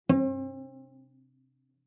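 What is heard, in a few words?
Cello pizz fortissimo sul D C4; MIS Pitches - 2014; Strings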